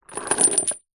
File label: industrial chain medium sized pick up from wood